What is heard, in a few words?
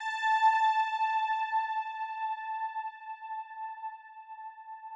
Fir A6